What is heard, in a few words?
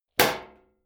club hit sheet clank medium